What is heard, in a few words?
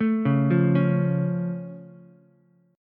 A Augmented A, Ukulele; arpeggiated; JGuitar; 2,1,1,4